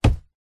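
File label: foley clothing jacket hit punch sweetener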